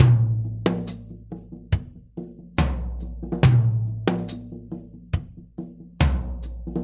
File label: Earth Loop, Drum Tools, Laid Back; Sonic Foundry (Sony Creative Software); Loops & Samples Drum Tools; Gone to Earth